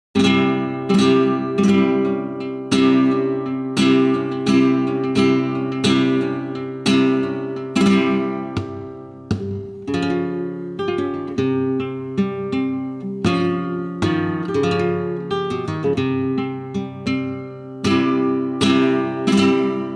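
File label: siguiriya, flamenco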